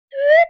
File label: Slide Whistle Short; Vintage Cartoon; Musical Elements